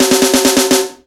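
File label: snare roll, Ragga & Dub Reggae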